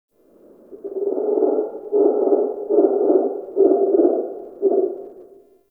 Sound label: Forest Creature Night Monkey Low Distant, Ambience; Warframe; Grineer